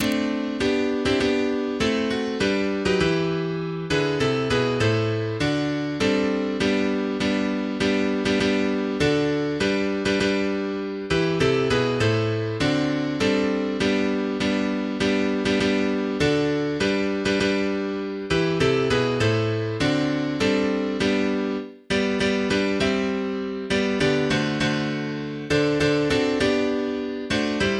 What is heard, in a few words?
O Christmas Tree Fast, MIDI